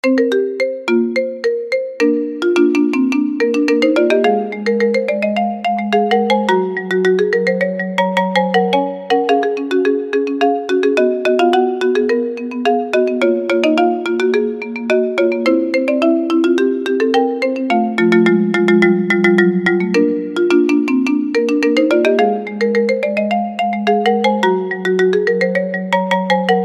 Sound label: Main Theme Marimba; Zelda